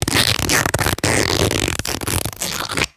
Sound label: long raspy cloth tearing